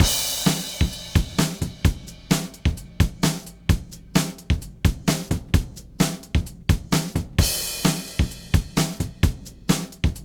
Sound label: Drums